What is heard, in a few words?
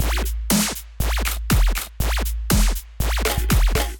Kit; Dance Faction Kit; Ableton; Build and Drop